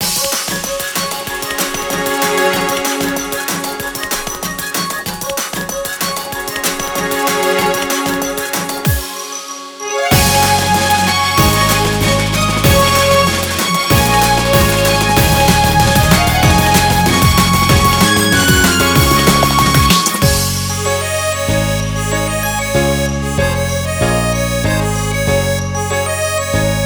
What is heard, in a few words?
boss loop; Sushiparty; mori boss loop